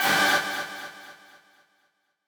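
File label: b stab1 a, note G octave 5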